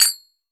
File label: Sagat Mid, Cymbals; Roland BK-5; Percussion; Gongs